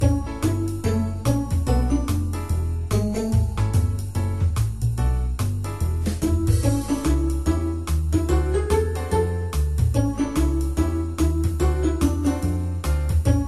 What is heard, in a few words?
music